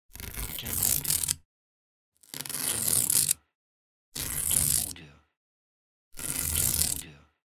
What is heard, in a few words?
Scotch Tape Set